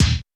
Roland U220 Electric Set, Drum Machines

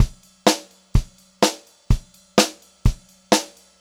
126 Pop-Rock Drumloops; USB Soundscan vol.37; AKAI

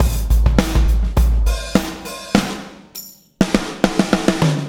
BLOW, BLOWUP

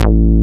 A-BASS, AN BASS

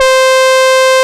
PROPHET 5, OLD SYNTHS; Rubber Chicken Software Sample Bank; SCI PROPHET

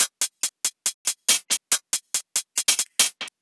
drum Loop 140BPM HH

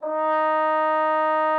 trombone D#4; Xsample VOL-3

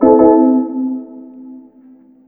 PIANO HIT; Ragga & Dub Reggae; PIANOS; AKAI; USB Soundscan vol.27

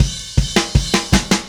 Happy Pub Beat Fill; Sonic Foundry (Sony Creative Software); Indie Rock Anthems; Session 05 - Happy Pub

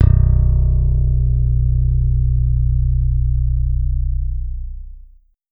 BASS FRET; Big Beat Samples & More

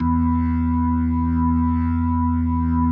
E2S, B3, Vintage Keyboards

